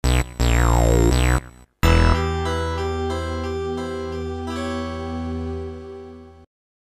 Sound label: Game Over